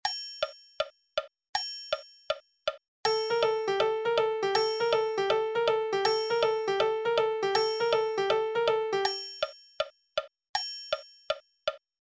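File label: rhythm swing ondo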